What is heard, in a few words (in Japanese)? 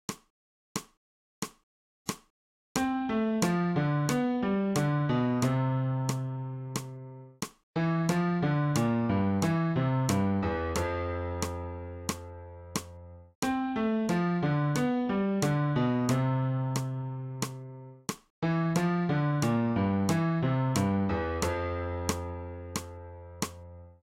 新フレットレス